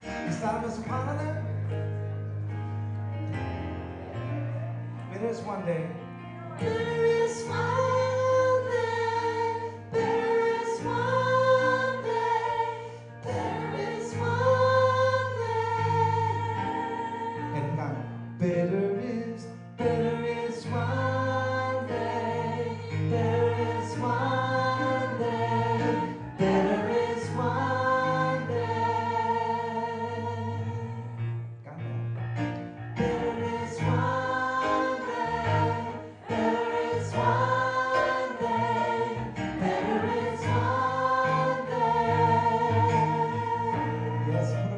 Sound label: better than one day sopran